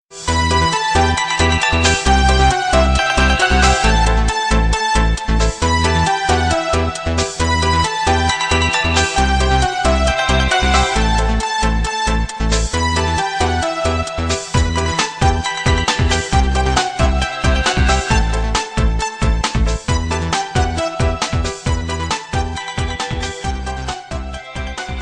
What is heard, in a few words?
Ring; Galaxy